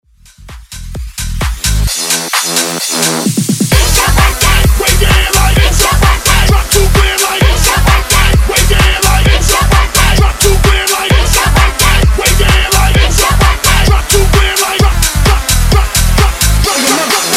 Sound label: Hype Reversed Drop 130Bpm